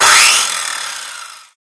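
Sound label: Movement Saw Spin; smite; god bakasura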